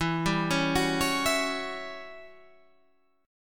E Minor 6th Add 9th chord

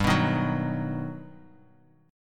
G Augmented Major 7th, Bass Standard; G+M7 strummed; JGuitar

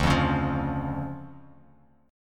D Minor Major 7th, D; 10,8,11,10; DmM7 strummed; JGuitar

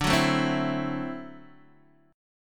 D 9th D down Guitar DADGAD

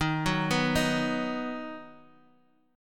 D sharp Minor Sharp 5th D sharp down; arpeggiated

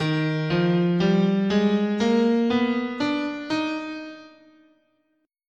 D sharp Harmonic Major, scale

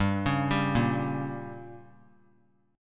G Diminished; arpeggiated; JGuitar

